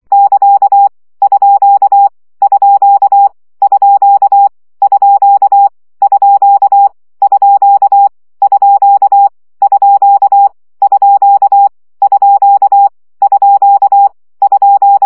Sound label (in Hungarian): CW gyakorlas alahuzas 20 baud